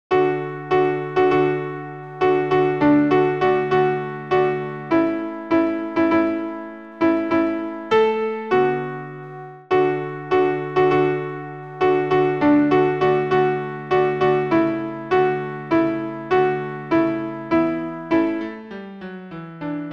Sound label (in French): alt pian acc